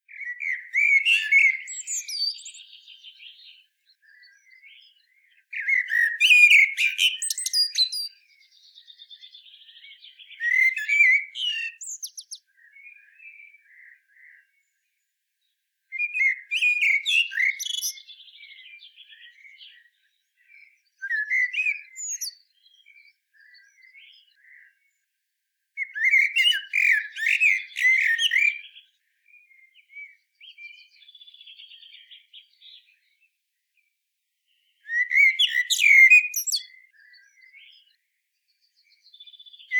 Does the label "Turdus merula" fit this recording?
Yes